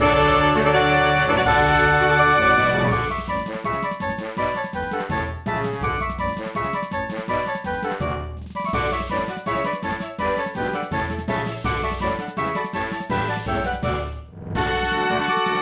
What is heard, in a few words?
victory gym; PokeRogue